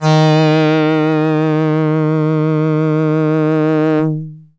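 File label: Box Cello DX100 D sharp 3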